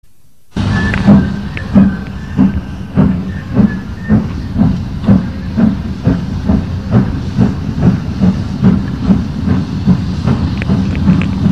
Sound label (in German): Kurzanfahrt; alsdorf